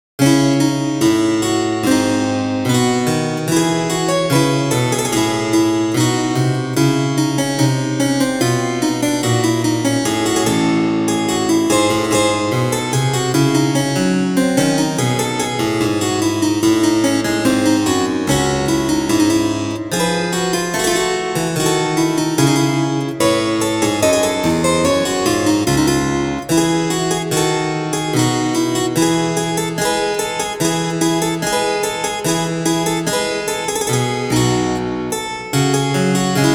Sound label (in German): kontrapunktstudie; mummenschanz